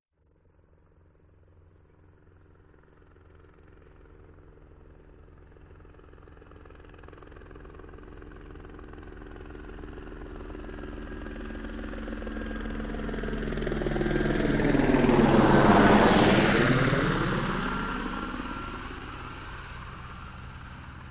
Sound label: helicopter flyby; aircraft